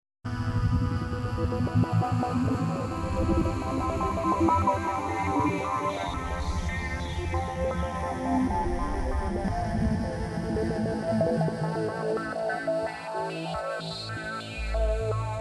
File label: ambient